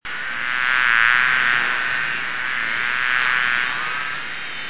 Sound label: Mosquito